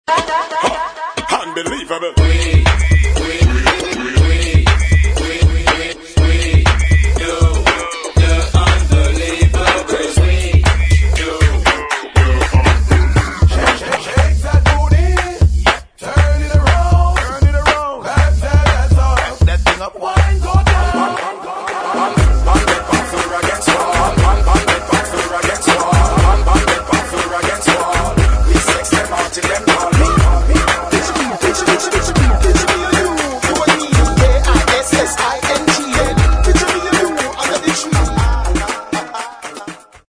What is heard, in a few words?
reggae session